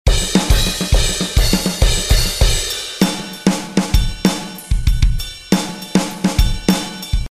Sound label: drums